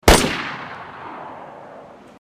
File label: single m4a1 gun; dayz